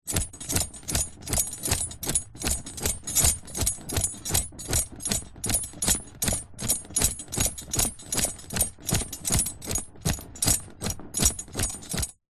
horse riding saddle movements leather metal jingles running; animals